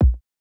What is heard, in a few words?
Modern kick, Electro